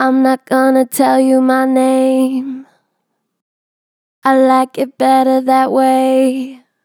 Strong Vibrato, Natural Vibrato Strong, Vocals; Harmor Vocals

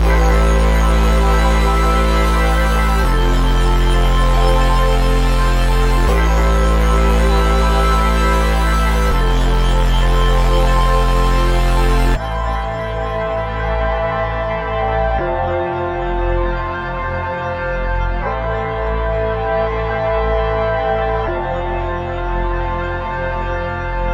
158 BPM A Min, Melody; Cymatics; 2022 Melody Collection; Wavy

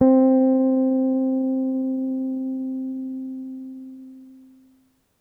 Lofi Guitar One Shot - C

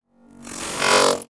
Glitch

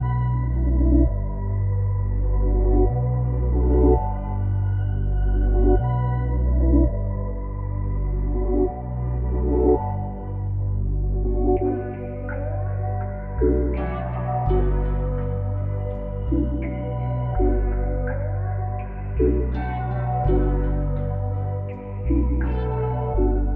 166 BPM B Min, Melodies; Cymatics; 2022 Melody Mystery Expansion

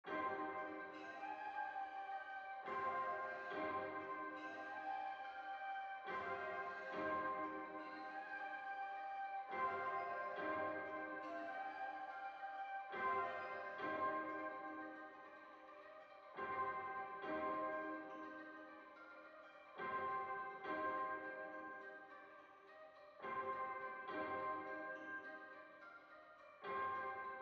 140 BPM C Min Plate Reverb; Trap; Melody Loops; Loop Stems; Cymatics

